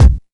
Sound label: low steel kick drum c sharp key